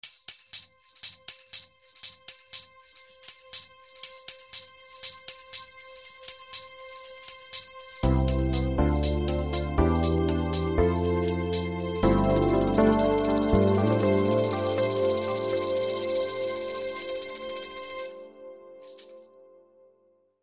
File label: Music of Tambourines